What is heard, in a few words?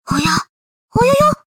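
sms, notification; OnePlus; Ace Pro; 10T; Genshin Impact; hutao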